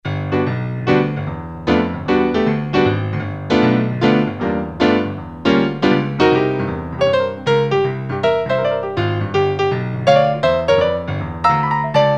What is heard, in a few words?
Piano